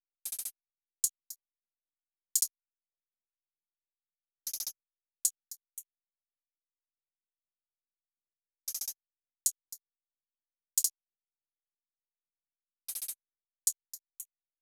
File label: Drum Loop 114 BPM Hihat; Loop Stems; Cymatics; Vibes II Drum Loop Collection; Prize